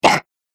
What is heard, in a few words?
Zombie Bark; Zomby; horror